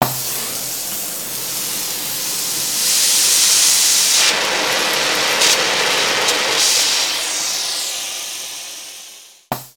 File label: welder WELDING; foley